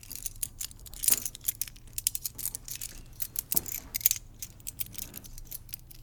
Keys Jingle

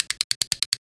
Clicks

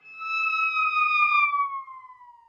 Violin Glissando

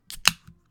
Soda Can Opening

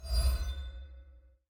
Magical Bomb Falling

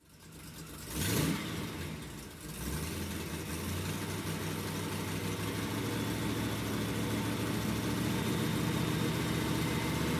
1953 Studebaker Idle Up To 6000rpm

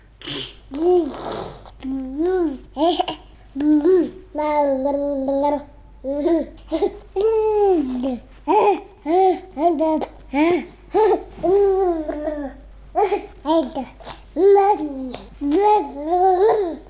Month Boy Making Some Noises

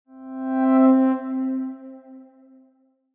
Atmospheric Notification

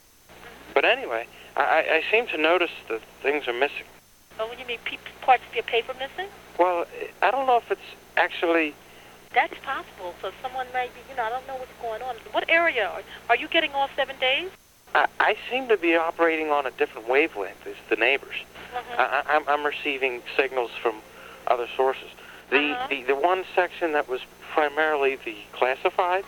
Call

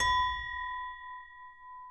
Glass Of Crystal

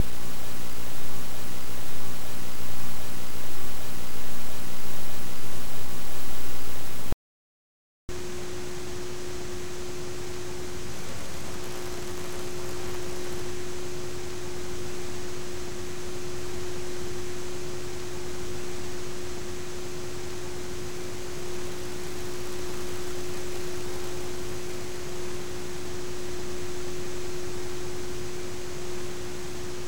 Vhs Hum Static

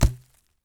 Hit Wood

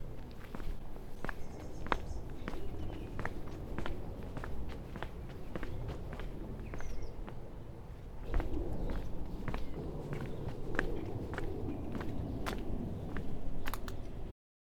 Walking On Concrete

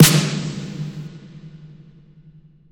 Short Hit Snare Bulb

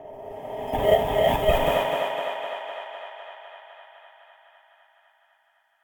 Rev Airy Thinger

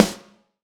Dnb snare

